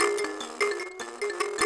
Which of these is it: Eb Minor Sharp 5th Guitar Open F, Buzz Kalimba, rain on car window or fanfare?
Buzz Kalimba